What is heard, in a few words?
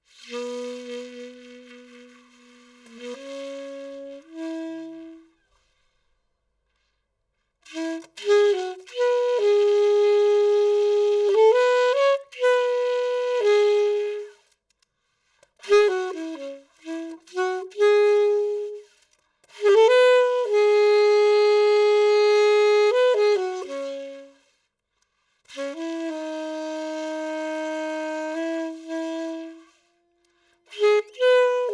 residual saxophone recording